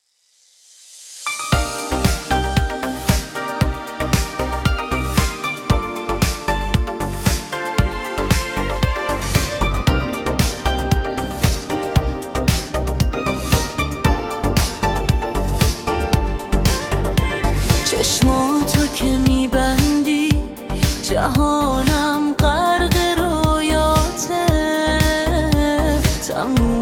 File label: Soft piano melody with atmospheric synt